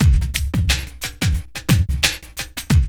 LOOP; E-Lab; X Static Goldmine 2

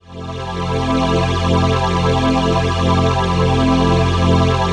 PHASE PAD, ATMO PADS; Best Service; XX Large

